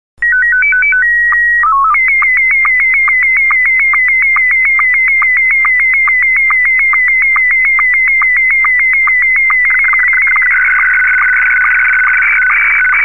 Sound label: sstv